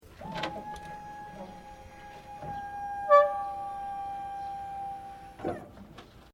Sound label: LIFT HYDROLIC TRUCK RAISE; Various lifts operating; Construction Vehicles